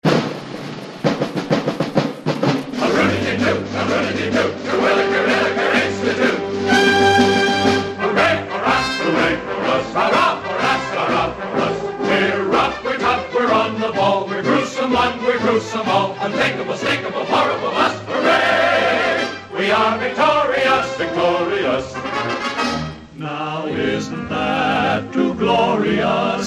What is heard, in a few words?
drum roll